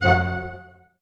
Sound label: STAC C, ORCH STAC; ORCH STAC.-M